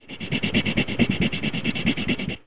Sound pencil

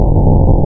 Noise; PCGamer50

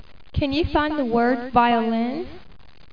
violin

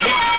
Gun Up; Funhouse of Love; shootoff

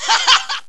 gremlin; Kinga 2.0

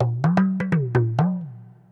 PERCLOOP ETHNO 125; eJay

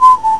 invite; Snak 2.0.1 PPC

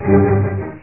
eraser

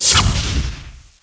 firebal; Total Chaos Nukem 3d Pack; duke

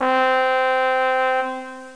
Trumpet; Castles - Siege and Conquest

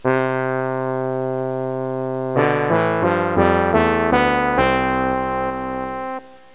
Tuba; Music Game

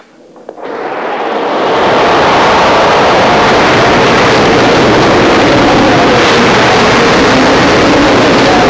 GALE FOR, NATURE

